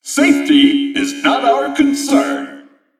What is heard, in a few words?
mech